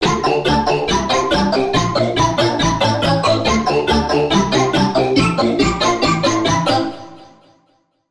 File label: Key; Node Choir; Routed Sound; Vital Expansion - Hyper Gamer Vol.1